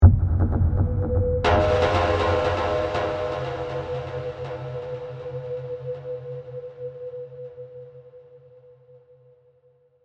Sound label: Musical Accent, Production, Element, Musical, Accent, Percussion, Eerie; Musical Sound Design Sound Effects; BlastWave